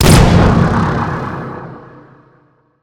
railgun fire, weapons; svencoop; rngremake

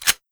Slide Release, 1911meu; svencoop

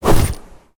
fist swing, weapons; svencoop; rngremake

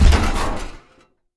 hatch close; svencoop; rngremake

